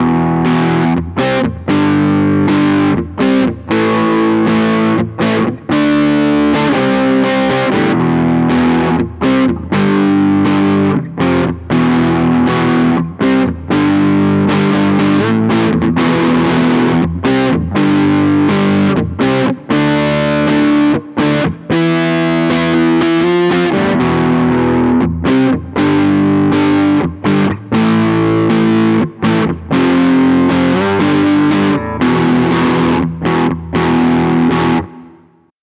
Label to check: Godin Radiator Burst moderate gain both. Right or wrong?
Right